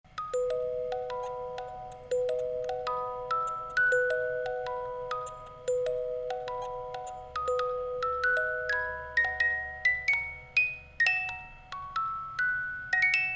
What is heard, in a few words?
music box